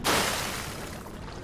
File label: dodge water shallow; Dark Souls III